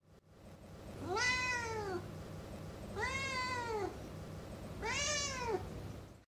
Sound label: cat, ambience; YesWeCamp